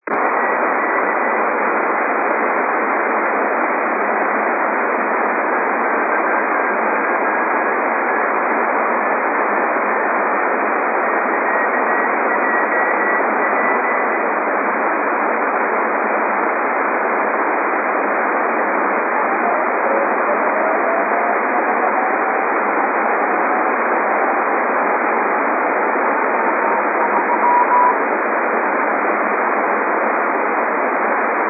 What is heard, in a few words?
co-57 xi-iv, Satellite Recordings; 7:03am Pass; I-Com IC-R20